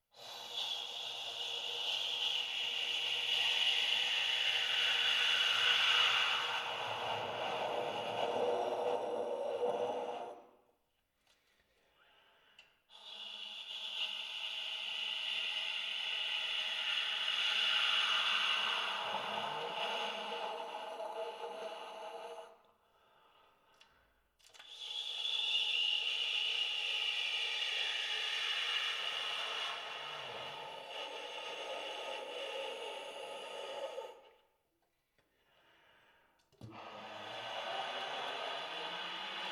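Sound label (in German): ten, Luft-Ton, Übergang von hell nach dunkel auf drei Tönen: tief, mittel, hoch; »Tonleitern tonlos«; Übergang Luft -Ton auf einzelnen Tönen. Tenor; saxophon; spieltechniken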